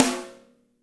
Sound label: SNR; Spectrasonics; Burning Grooves